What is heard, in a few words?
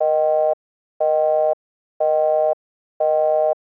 Busy Tone